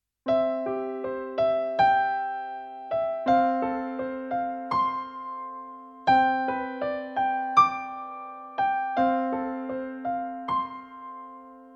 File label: Piano